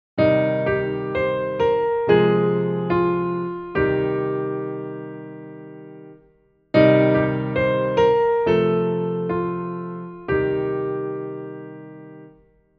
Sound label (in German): dorisch